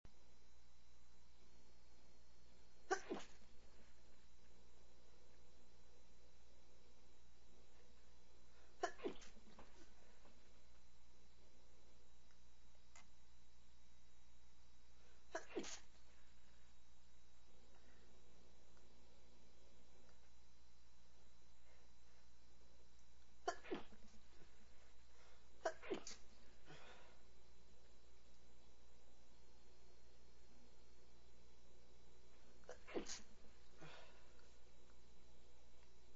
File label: some stifles, male, sneezes